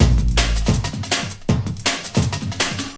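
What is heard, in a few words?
groove loop, drum loops and breaks, 161 bpm; groovuploop; 161 to 180 bpm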